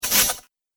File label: Droid Electro Move, robot; Robot Sound Effects